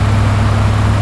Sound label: auto small engine street